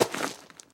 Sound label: flint step; Golden Crayon Sounds